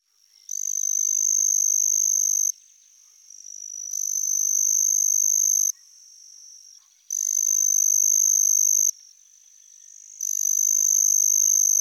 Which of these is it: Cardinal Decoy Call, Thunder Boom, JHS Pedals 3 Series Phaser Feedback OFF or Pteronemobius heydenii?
Pteronemobius heydenii